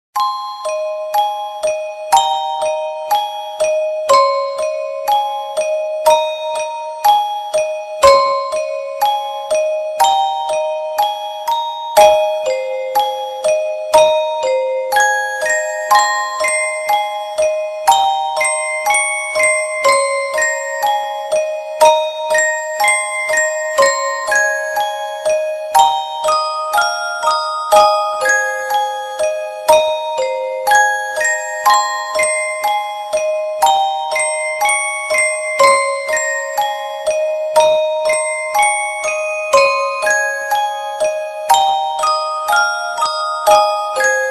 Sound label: music box version